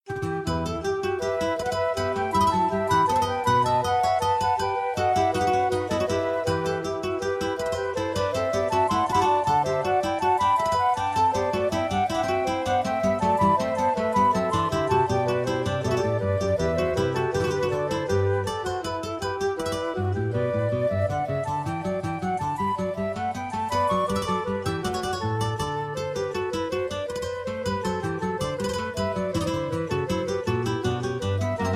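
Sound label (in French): inventions pour guitares flûtes; bach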